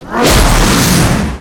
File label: Cars crash